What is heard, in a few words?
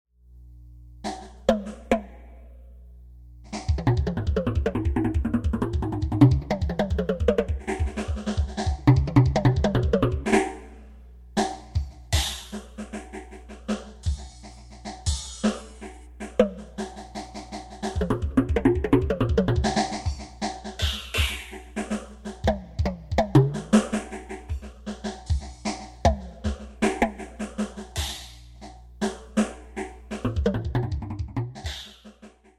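timbre, grooves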